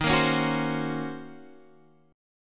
D sharp Minor 13th; D#m13 strummed; x,6,8,6,7,8

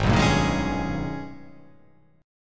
G sharp Minor Major 11th; 4,2,5,3,3,3,3,5

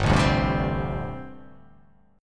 G Suspended 2nd Suspended 4th down; Gsus2sus4 strummed; JGuitar

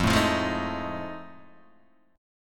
F 7th Sharp 9th, Guitar, Open D

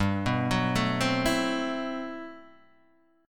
G Major 13th, Guitar DADGAD

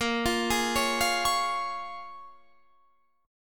Bb Minor 7th; Bbm7 arpeggiated

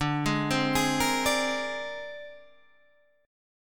C 7th Suspended 2nd Sharp 5th D

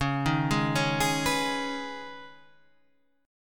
C sharp 7th Suspended 2nd, guitar chord

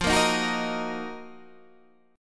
Eb Minor F; Ebm/F strummed; JGuitar

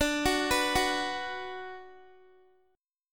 D 6th D down Guitar, chord; D6 arpeggiated; tuning 4F,4A,5C,5G,5C,6E

